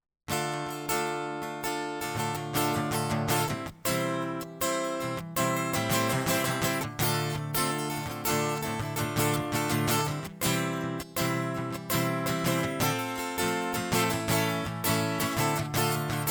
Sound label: guitar; The Beholder